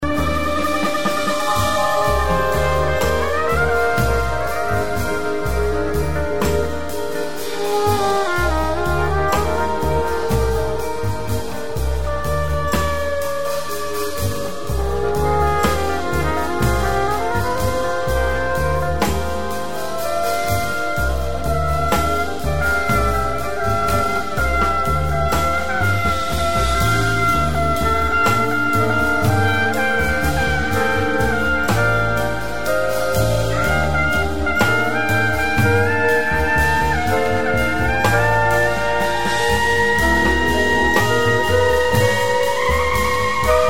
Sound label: chime trance